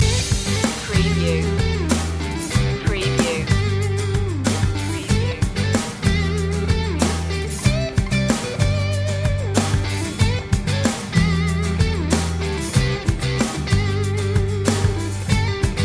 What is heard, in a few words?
crash loop